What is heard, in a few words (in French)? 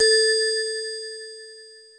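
"la", French; Mobiclic; Jeunoel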